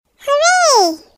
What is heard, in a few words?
hoorayy hipitch